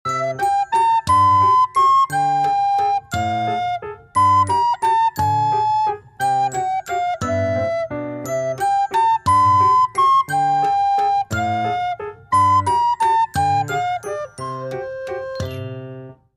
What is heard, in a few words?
baby waltz samsung galaxy s21 ringtone